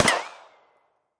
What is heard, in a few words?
RICOCHET; riccos